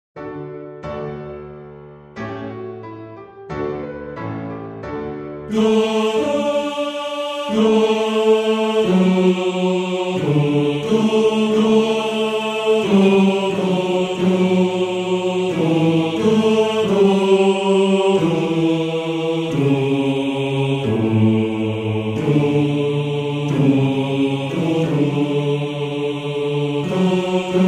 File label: Bass; Die Ehre Gottes; Bass 1